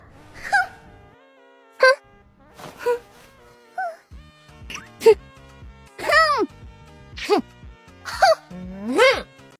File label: humph